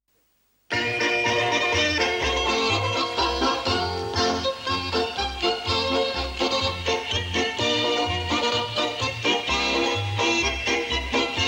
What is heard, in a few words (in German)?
Tanzversion; Jingle Bells